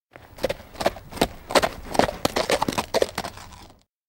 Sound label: steps horse stop; anemoi